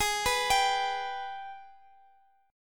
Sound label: Minor Major 7th, Guitar, Open D; Gsharp